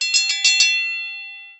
Boxing Bell

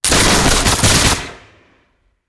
Musket; Sound Ideas; Guns

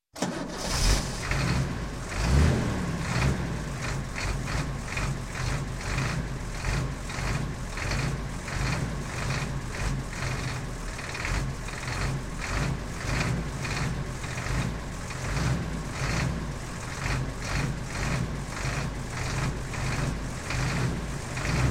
Diesel Truck, Start, Rev, Stop; Sound Ideas 2000 Series

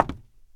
wood; firefight

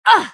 female pain; firefight

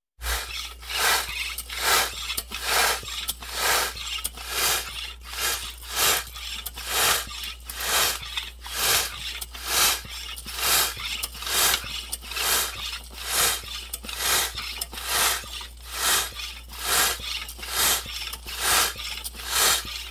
Walking Machinery Trucks

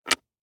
DICE on Hard Wood, Grab, Standard, 3 Three Dice; Samples; ArticulatedSounds; DICE Sfx Party (Extended)

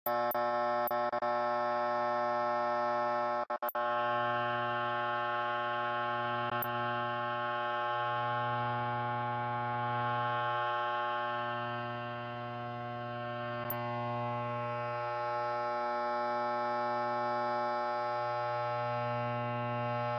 Street Light; DeadFright

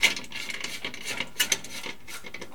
Blinds Window Twist; SFX Kit; Foley - Misc

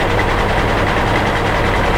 Truck Semi Idle Loop

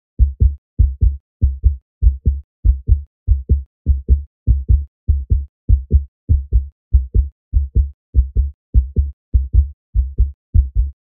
Heart Beat Very Fast; Hollywood Edge; sound designer's toolkit v2

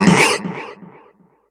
Wisboar Shaman Death; Kilta

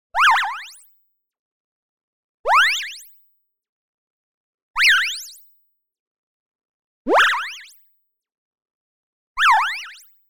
TONAL Friendly Hello; boom library; Anime Essentials

